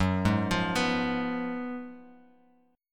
F sharp Major 7th Suspended 2nd Suspended 4th F sharp, Guitar Open F; F#M7sus2sus4 arpeggiated; 6,3,5,6,x,x; chord shape